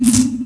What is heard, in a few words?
Zap; Star Wars Obi-Wan; XBOX